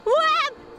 uuAAAAH

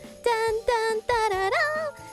Singing

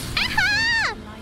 cute death scream

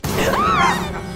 Scream; IRyScream

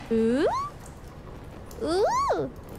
uuhuu; noises

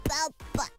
bulbasaur impression

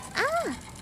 hope noises

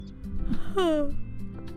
Yawn

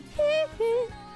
hee hee

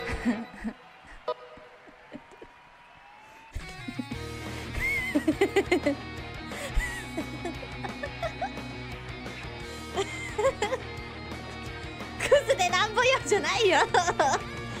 mental breakdown laugh

